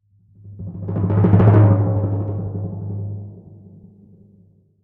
Crescendo-decrescendo G, Timpani; PowerFX; Downtown Orchestra

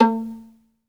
SOLO VI, Synclavier Strings; ILIO

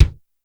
kick, Roland R-70, BD

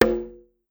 Conga Hi Slap, Clavia DDrum AT, Pitched Down

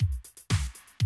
Classic Drum Loops

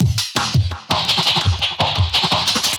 Boom Bam FX, Loops, breaks; m8 breaks

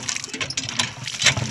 SLOSHEY TH, MACHINES

